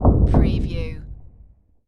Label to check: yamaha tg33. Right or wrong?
Wrong